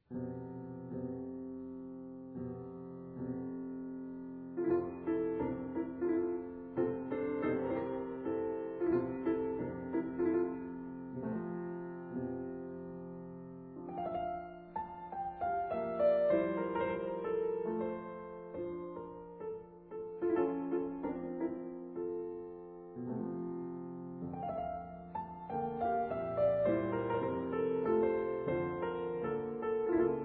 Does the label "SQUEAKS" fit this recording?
No